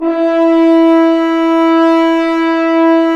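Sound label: BRS F.HRNS, French Horns Ambient; Roland L-CDX-03 Disk 2; F.Horns 1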